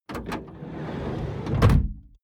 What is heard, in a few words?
car slide door close